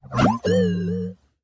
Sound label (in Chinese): 再见的哔哔声; 堡垒（守望先锋）; 普通语音